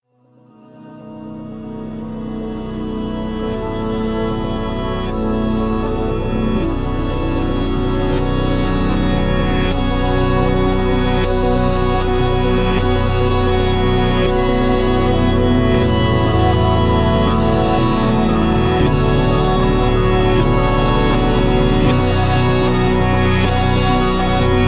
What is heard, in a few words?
Ambient Remix